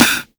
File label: SD, korg drums; Korg Electribe; ESX-1; electribrary-3